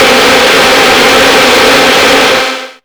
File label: TRASH WIND, Hard & Loud Techno; USB Soundscan vol.01; AKAI